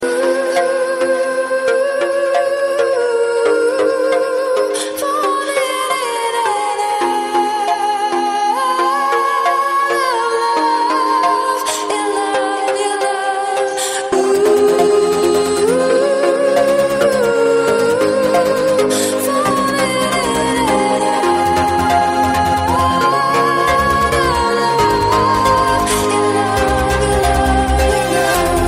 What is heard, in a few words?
soft voice ringtone